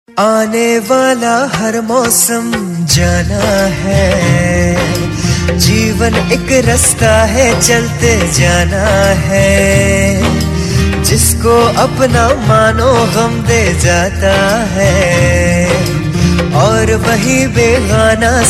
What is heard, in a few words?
Male